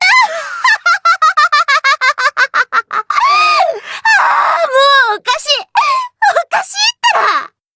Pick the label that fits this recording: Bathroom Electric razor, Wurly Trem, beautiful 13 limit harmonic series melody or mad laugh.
mad laugh